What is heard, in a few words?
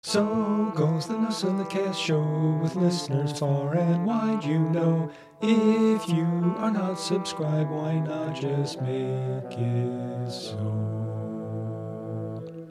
Shanty Outro